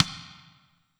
Rim

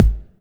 M-Audio Venom Kick Drum

